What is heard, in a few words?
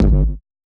Bass Kick G, 140bpm; Zero-G Sound Sense Grime Scene; GS Construction Kits; Just Flex